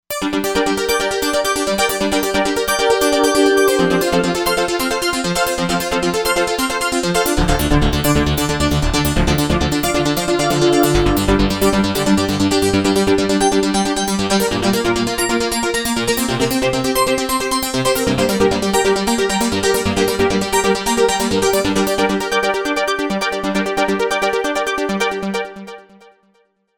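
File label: Sequence FM Line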